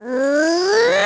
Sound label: Throw shell; DS; NEWSMB; Bowser Jr